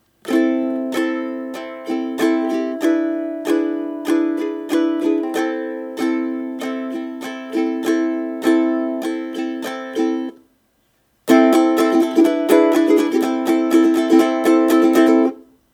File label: Main Strum, ukulele